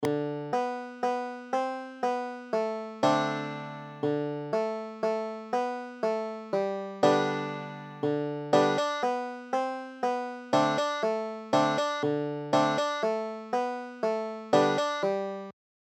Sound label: banjo